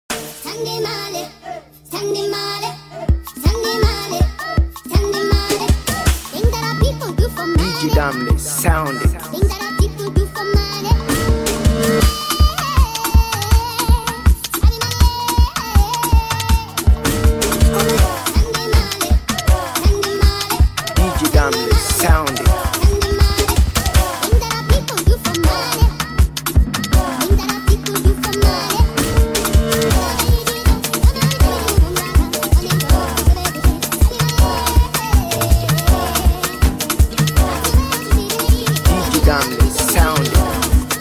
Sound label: Dance Beat